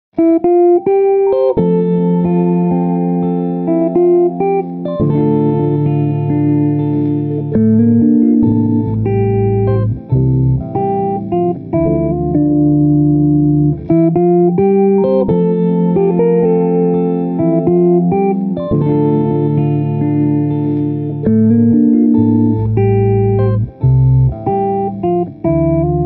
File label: GUITAR